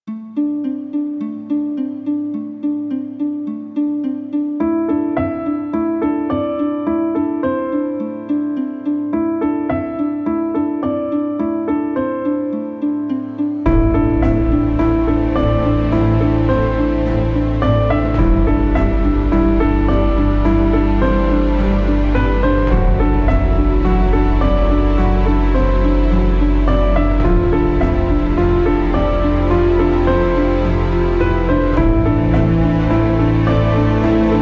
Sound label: Missile Launch, Original Background Score; Beast (Original Background Score) (2022)